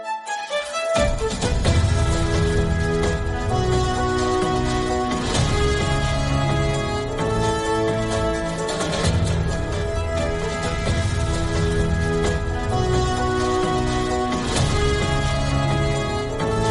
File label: news channel bgm; Wion News Channel